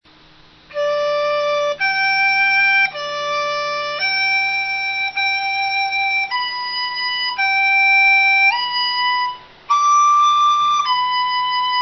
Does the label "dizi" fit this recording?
Yes